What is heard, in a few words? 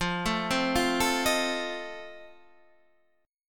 F 7th F down; arpeggiated